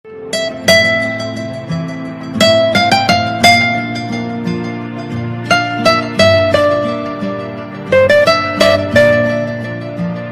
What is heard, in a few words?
instrumental ringtone